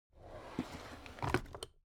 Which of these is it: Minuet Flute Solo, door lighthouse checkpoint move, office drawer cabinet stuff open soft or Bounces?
office drawer cabinet stuff open soft